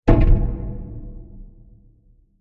Dark Impact; Blasts (Sound Effects:Impacts)

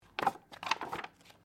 Book Page Heavy Turn; Book movement; Books (Sound Effects:Offices)

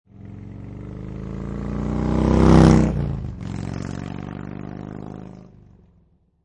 Motorcycle Harley Davidson Drive By Right To Left; Motorcycle, Motorbike Harley Davidson

